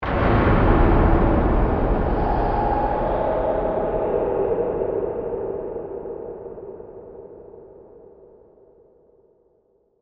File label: Horror Impact, Metal, Scrapes, Reverb; Production Element, Imaging Element, Accent, Transition; Crash